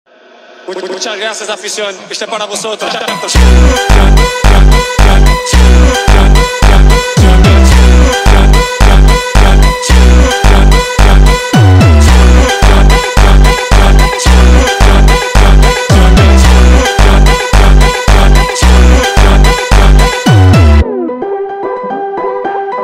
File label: funk